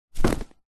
clothing item jumper drop on floor; zapsplat

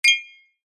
multimedia alert notification glassy flick short

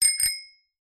bicycle bell ring; vehicles

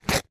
foley paper ticket cinema rip

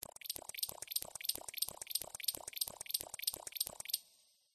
squeaky metal Designed UI; PMSFX

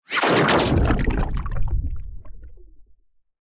bullets x3 impact water fast underwater pov; zapsplat

